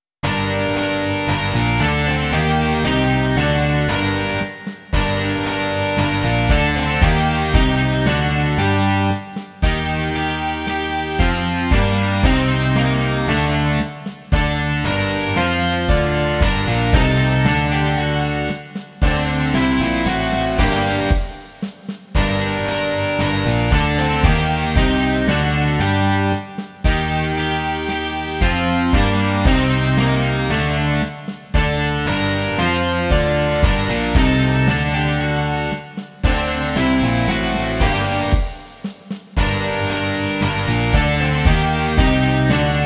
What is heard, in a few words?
rock